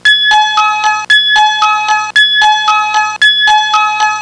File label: waiting bells intro